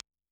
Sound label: Altec 670A, IR